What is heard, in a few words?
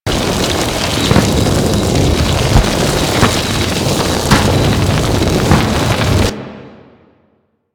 Jetpack Thruster Loop; Warframe; DE; Vehicles; Veilbreaker